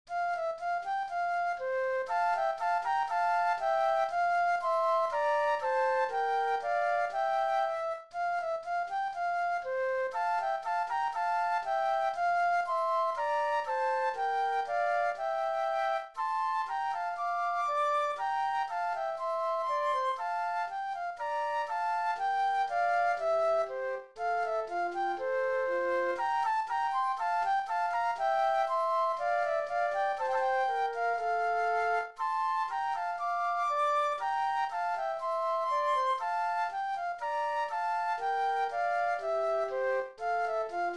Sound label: Gavotte Flute Duet; classical; Handel; HWV 397